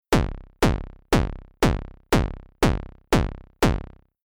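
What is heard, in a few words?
synth mod pitch envelope kick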